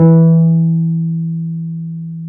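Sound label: piano, Wurly, Keys of the 60s and 70s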